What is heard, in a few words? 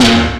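deep metal, snare, percussion; SDs; SamplePool3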